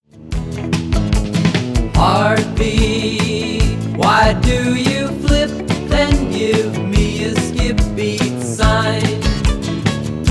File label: heartbeat